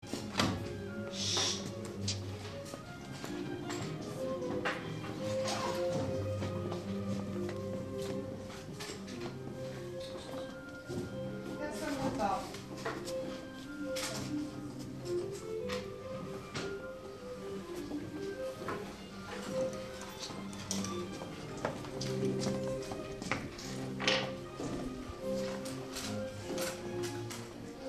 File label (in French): musique